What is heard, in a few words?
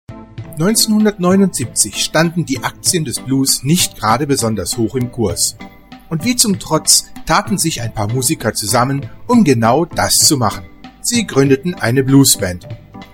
Blues Band